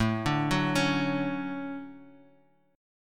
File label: D Major 7th